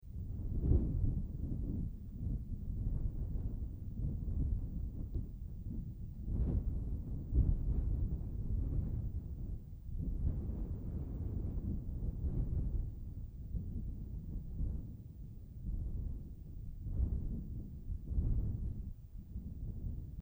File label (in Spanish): vientos mezcla; Engie Planta Solar Coya